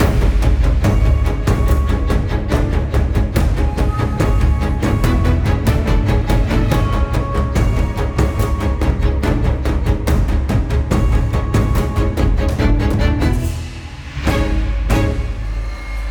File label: music combat loop